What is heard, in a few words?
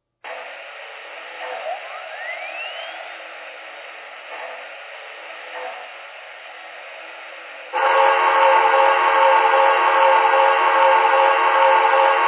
hudson; nyc